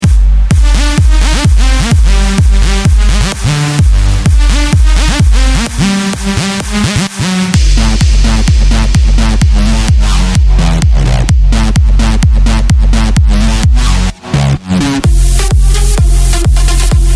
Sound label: EDM MIDI Hooks